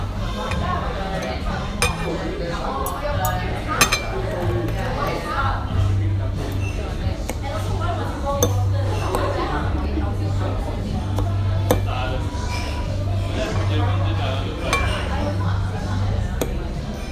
Oriole Bar

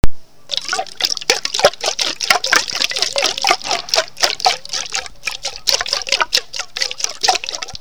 air

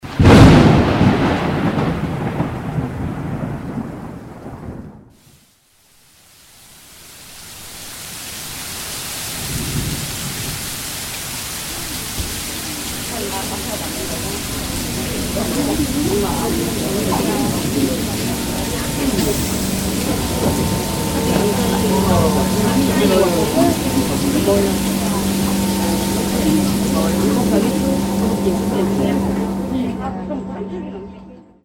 Dark sounds

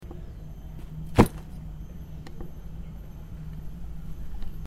Bag Drop

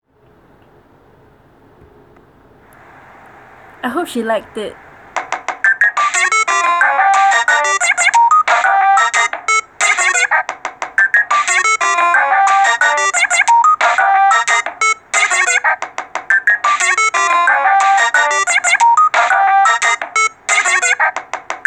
Beep with voice; Mario

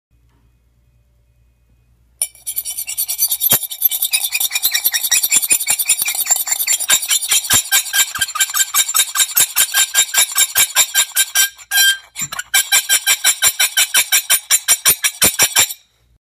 Fork Scratching on Plate

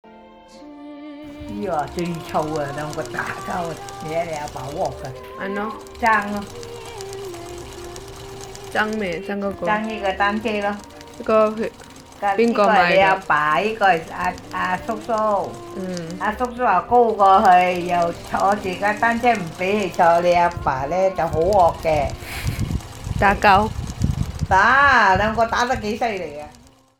sewing machine scene

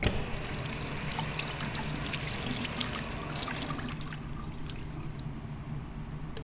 WASHING HANDS

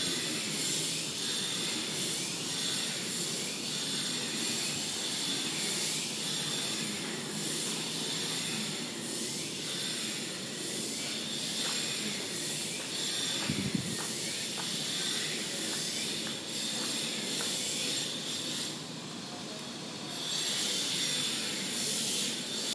Construction

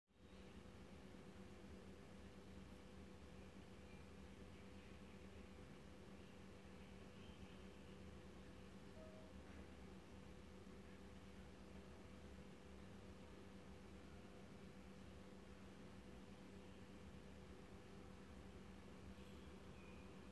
classroom sfx

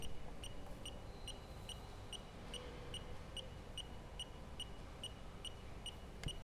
BEEPING FROM THE CARD READER

